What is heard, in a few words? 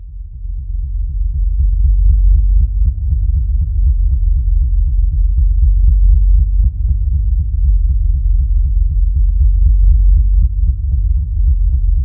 THROB, MACHINE THROB; Zero-G Ambient 1